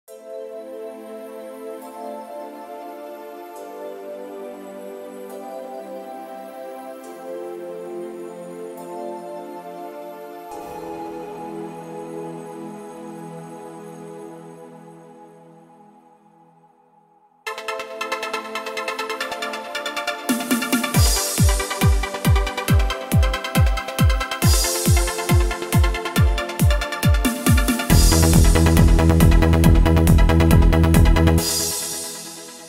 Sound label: instrumental